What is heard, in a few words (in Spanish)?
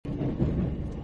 ambiente en vagon loop